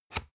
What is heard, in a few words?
spring binder lock